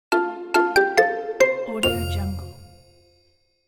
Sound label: cartoon ending; cook-sfx